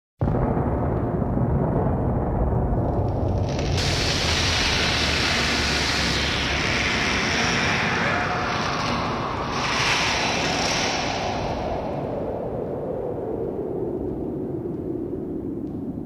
zvuk vzryva i razrushenij

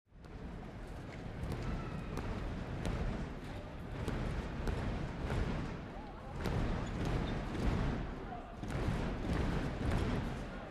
topot bolelschikov

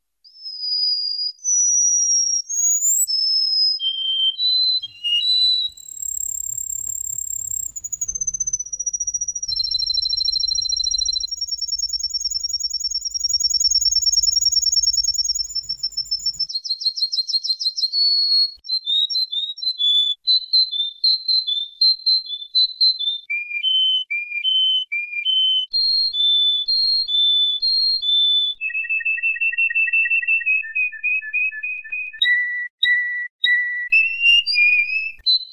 kanarejka poet; 3D звуки

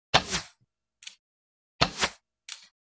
zvuk strel proletajuschih mimo

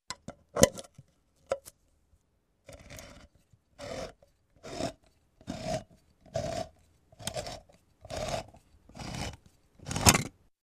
zvuk konservnoj banki

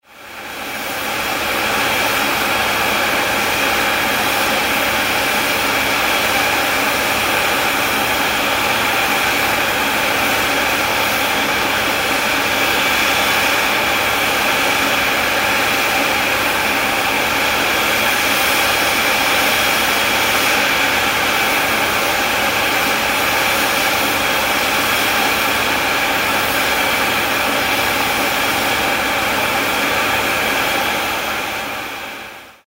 parovoz ozhidaet na perrone

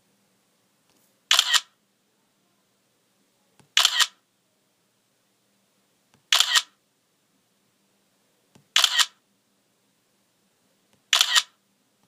zvuk fotografii na ajfone

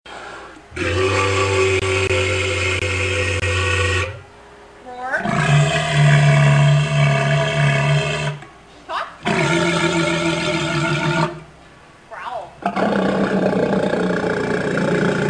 hriplyj rev morzha

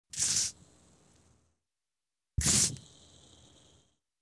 otkryvajut probku gazirovki

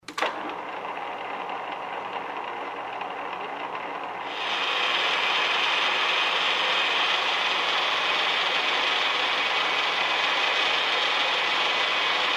zvuk frezernogo stanka

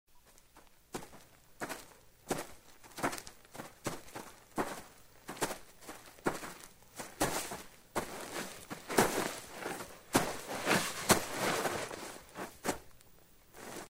progulka po trave

